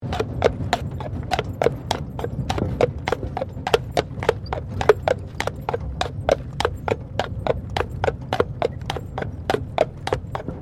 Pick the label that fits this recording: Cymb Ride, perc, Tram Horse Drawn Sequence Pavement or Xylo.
Tram Horse Drawn Sequence Pavement